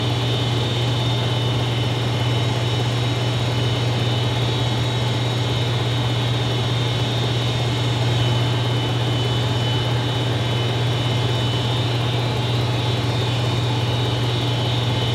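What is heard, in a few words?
ambient air conditioner condenser loop, electric; Half Life Model Pack; Half Life Alyx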